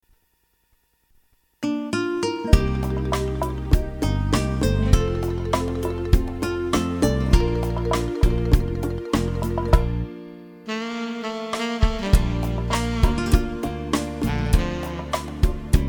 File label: Rumba Medley